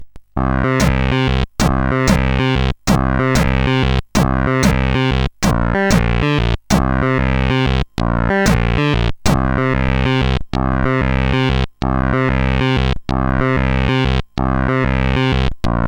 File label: Test Music, sid CSG8580R5